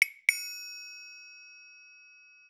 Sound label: SmartNotif - Pebble - DRY, Smartphone Notification Sounds; aXLsound